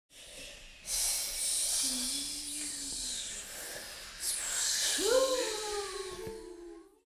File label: Ghost children horror atmosphere